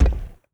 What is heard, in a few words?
reel stop